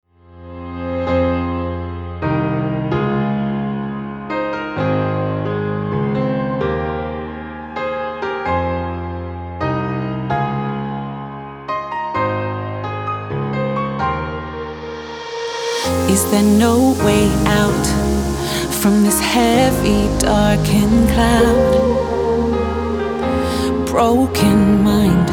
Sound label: 4A 130 Trance